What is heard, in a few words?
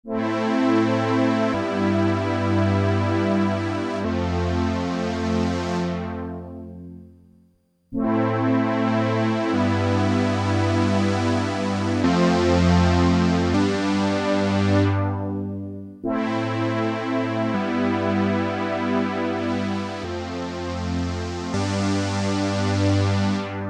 Slow strings; Pro Vs Mini